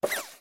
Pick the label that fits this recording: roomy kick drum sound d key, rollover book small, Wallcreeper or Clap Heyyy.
rollover book small